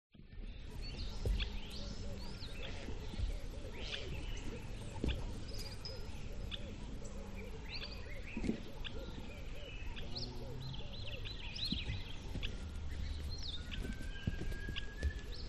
ATMO NATURE Country Birds Call; smartsound

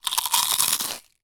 human bite potato chips crisps many single; zapsplat

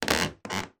wood creak floorboard; foley; sound effects; sound effects one

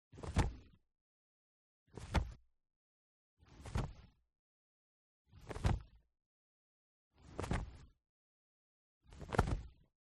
ANIMAL BIRD Wing Flap Leather Single; smartsound

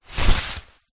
raincoat arm slide into sleeve; foley

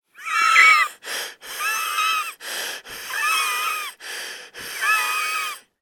human male wheeze breathe

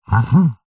horror yeti monster grunt calm loving; zapsplat

